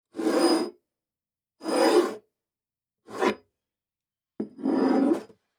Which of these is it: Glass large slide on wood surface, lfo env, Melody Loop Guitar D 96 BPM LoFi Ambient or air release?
Glass large slide on wood surface